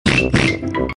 Grunt; Peppa Pig

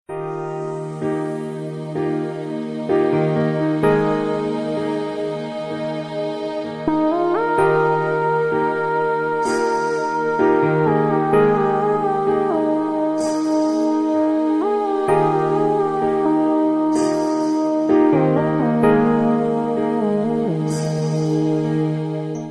Music

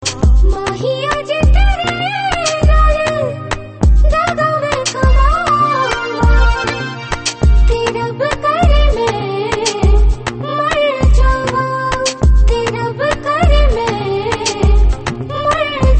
female

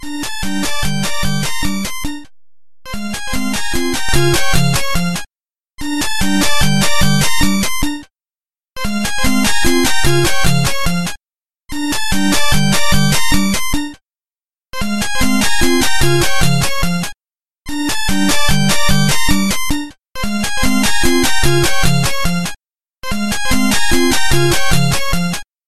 Galaxy S5 ALARM clock

